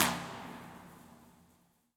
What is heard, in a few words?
Drainage Tunnel, Impulse Response; EchoThief Impulse Response Library